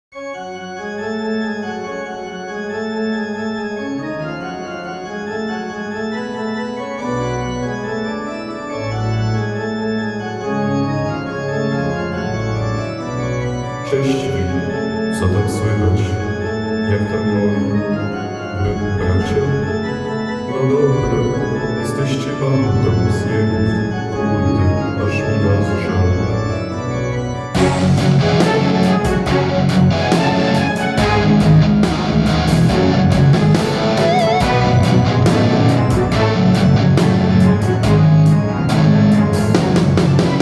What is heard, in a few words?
jumpstyle chorale mix